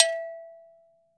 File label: afro ago, afric ago go